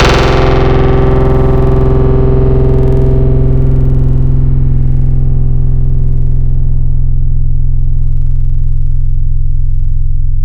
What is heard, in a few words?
One Shot BS Party, synth; Wavetables; Synth One Shots; Synthetic's Serum Collection; ESW Core Wavetables; Echo Sound Works Core Tables